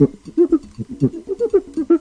119 guira funnybeat, drum loops

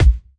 kiks

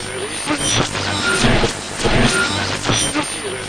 Radio Noise, Radio Tuning Noises

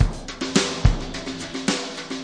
107 deep behind it, DRUM LOOPS and BREAKS; 101 to 110 bpm

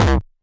nasty stormfront, Electro FX

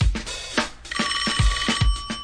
107 phone ring, drum loops

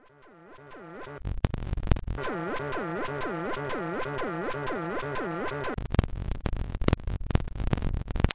broken cord alarm; Alarm Sounds

